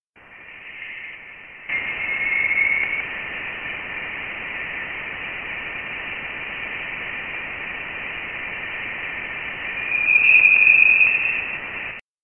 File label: Voyager Interstellar Plasma Sounds